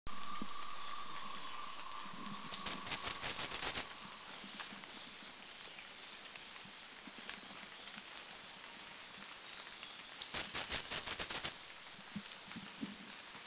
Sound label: Taiwan Wren Babbler Blue Gate; Taiwan Wren-Babbler Pnoepyga formosana Country endemic; Blue Gate track